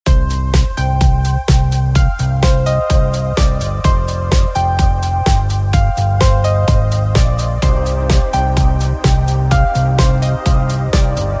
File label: instrumental